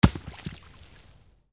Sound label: WATER